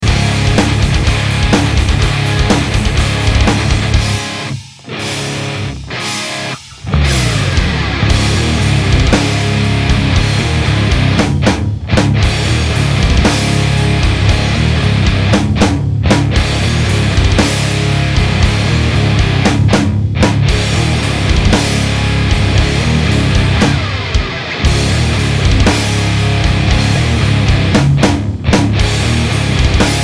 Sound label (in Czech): ukazka basa